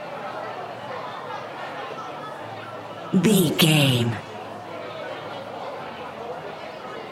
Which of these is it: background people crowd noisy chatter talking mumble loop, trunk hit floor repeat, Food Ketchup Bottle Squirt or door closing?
background people crowd noisy chatter talking mumble loop